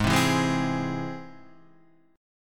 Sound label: G sharp Augmented, Guitar, Half Step Down